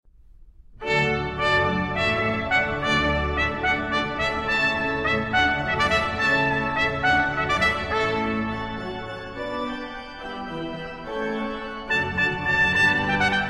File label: Wedding Hornpipe